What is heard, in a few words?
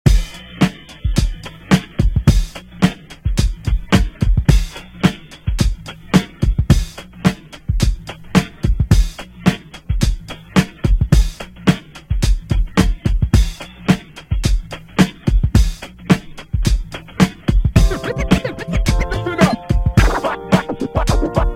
Funk Scratch In Out Redrum 108Bpm